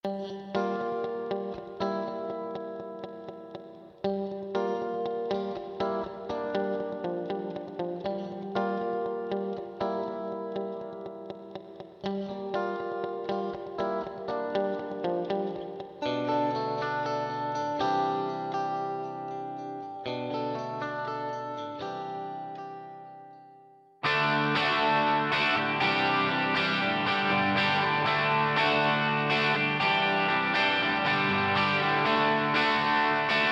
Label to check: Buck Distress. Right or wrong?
Wrong